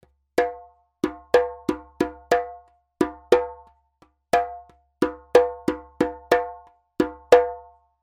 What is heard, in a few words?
djembe; djembebog oevelse